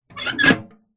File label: foley metal box large pick up handles